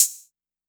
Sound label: 808 Hpd, Roland MV-8008; Roland MV8800